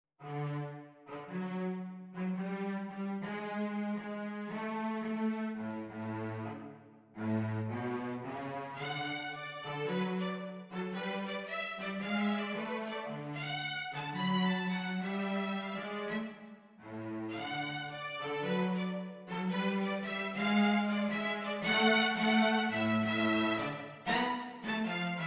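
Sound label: violin Cello Duet, jazz duet; stringed instruments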